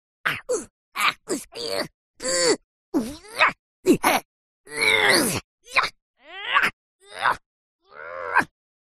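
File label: deals and takes blows, cartoon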